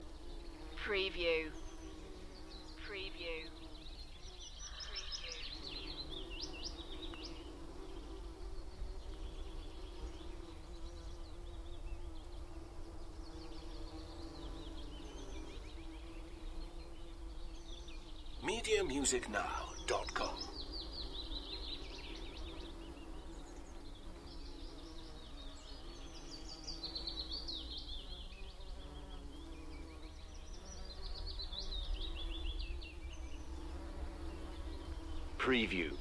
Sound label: Spring birds song and a tree full of bumble bees Beacon Fell Preston; beanstalk audio